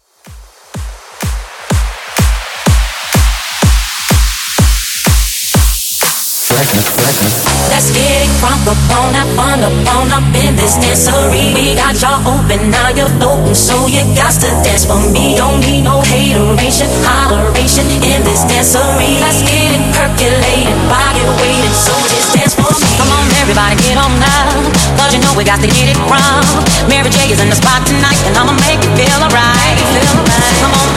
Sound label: Dance Rework 125Bpm; remixplanet